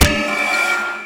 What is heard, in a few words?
enemy block